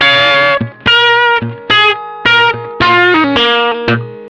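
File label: blue riff, Vintage Blues Guitar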